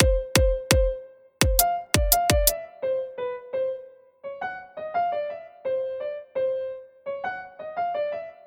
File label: RDrum